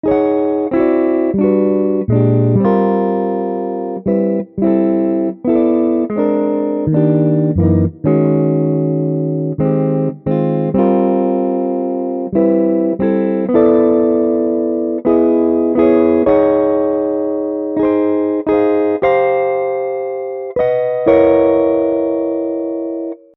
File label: jazz guitar